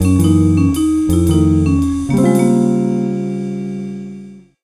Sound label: Puzzle Joyeux